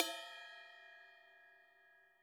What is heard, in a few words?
Trash, drum